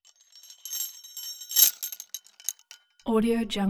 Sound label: pitched just chains tighten whip swing; soundbits-soundfx